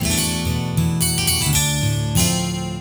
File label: 12 String Guitar; Sonic Foundry (Sony Creative Software); Mac Money; Guitars